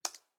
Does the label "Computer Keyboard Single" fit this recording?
Yes